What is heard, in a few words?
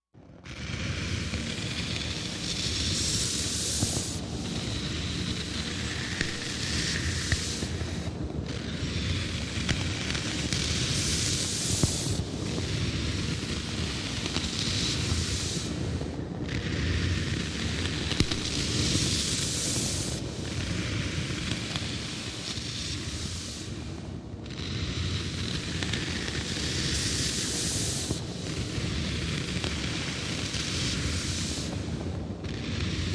Lava Wave Electrical Sparks Flow Over Molten Lava